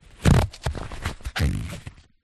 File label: household rubber glove rub creak